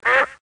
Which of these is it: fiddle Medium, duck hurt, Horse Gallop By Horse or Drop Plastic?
duck hurt